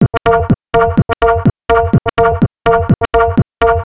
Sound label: Tabla, 125 BPM; Zero-G; Pure Tabla